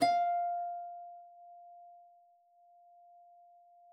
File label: HARP PIANO S, E5; Xsample VOL-8